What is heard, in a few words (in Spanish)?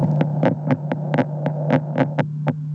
hembra redoblante; エンヤ ツノゼミ、メスの長太鼓２; 録音 ヒメナ・ミランダ